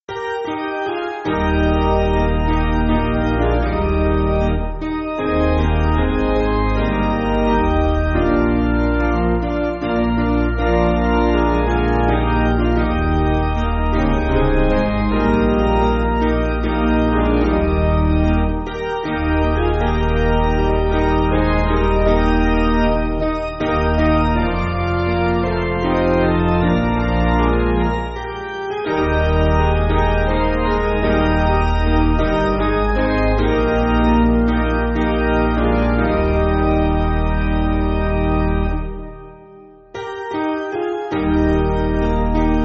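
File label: Piano, church backing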